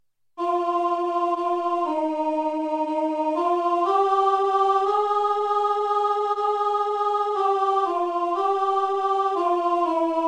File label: alto; Pues que ya nunca nos veis